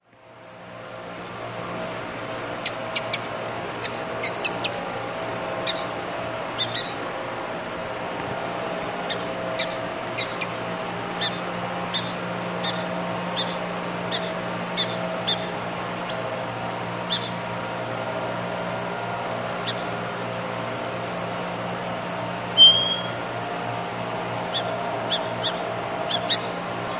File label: song sparrow call